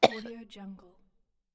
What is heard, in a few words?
female cough